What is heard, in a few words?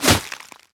Shotgun bullet hitted; Finalboss